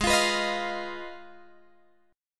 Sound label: G sharp Minor 6th G sharp down Guitar Open D